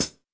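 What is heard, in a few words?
hi-hat, Real Mega Drums; Best Service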